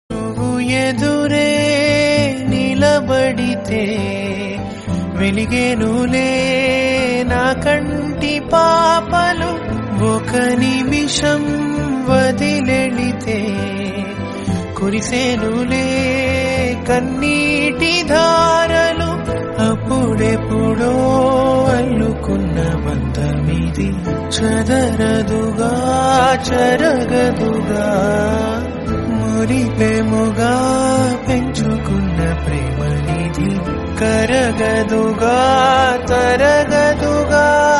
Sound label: telugu; baby movie